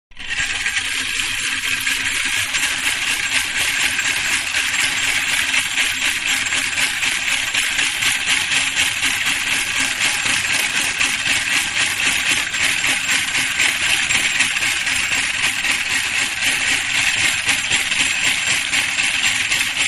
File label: Egg Beater; Kitchen; Household; Manhattan Production Music